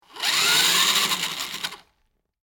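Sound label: Radio Controlled Electric Car Revs, close up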